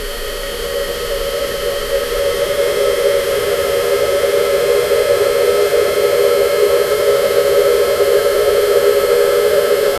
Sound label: kettle; nag iinit; household